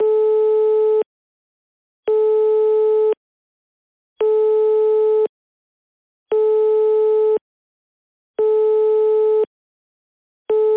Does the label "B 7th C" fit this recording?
No